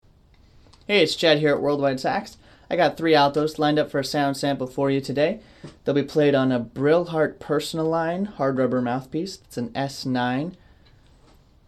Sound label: altos; 6M vs BigB vs Comm1